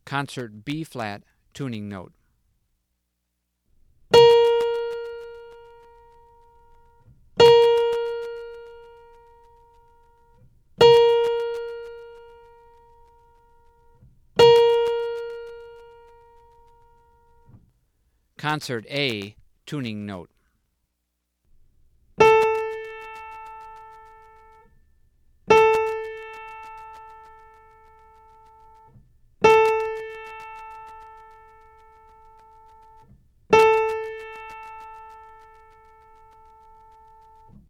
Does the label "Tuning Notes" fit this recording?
Yes